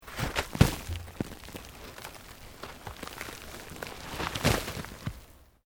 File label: EARTH LOOSE MOVE LONG, Debris